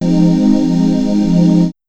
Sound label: pad